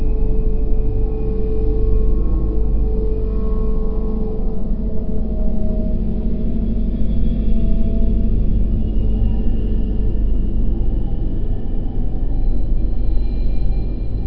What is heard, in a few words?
Crypt Ambience Loop; jenny leclue detectiv